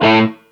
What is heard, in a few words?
GTR A MAJ, ROCK GUITAR; Greytsounds VOL-1; ROCK GUITAR1